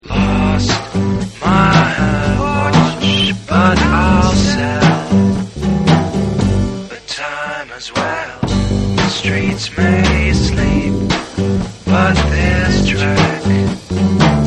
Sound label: old acoustics